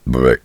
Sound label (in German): ruelps